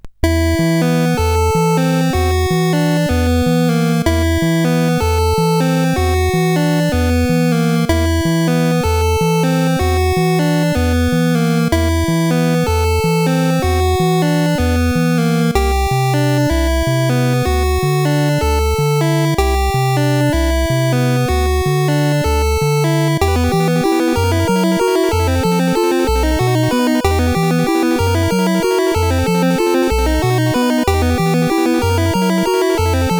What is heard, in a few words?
sid CSG8580; metroid tune 5